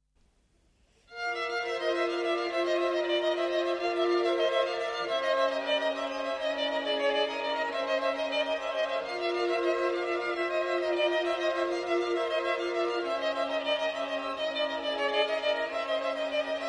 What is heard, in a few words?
String Quartet